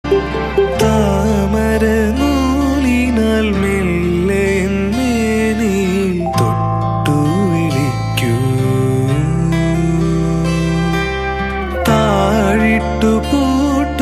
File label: unplugged